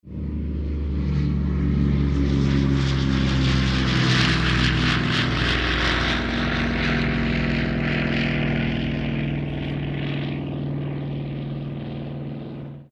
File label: airplane propeller passing by